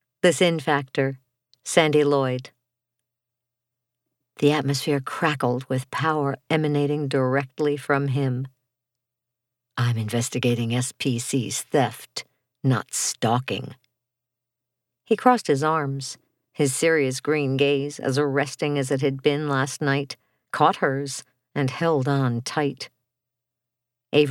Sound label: romantic suspense